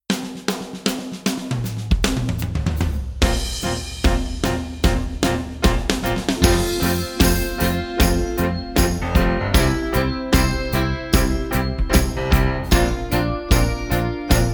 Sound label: Bows